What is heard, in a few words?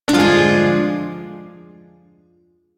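Shawzin Minor Chord; Warframe; Duviri Shawzin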